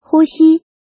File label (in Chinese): 呼吸